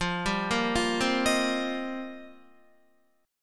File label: F 7th Suspended 2nd Suspended 4th, Guitar; F7sus2sus4; arpeggiated; 12,10,10,10,12,11